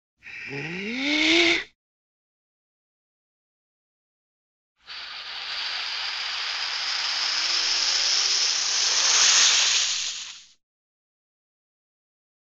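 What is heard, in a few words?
cartoon breathing compilation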